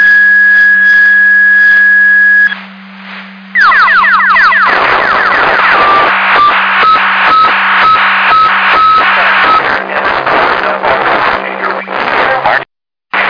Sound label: Siren; Hamburg FD